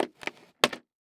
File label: Emergency First Aid Kit Plastic Opening And Sliding On Concrete; emergency effects; AdobeCC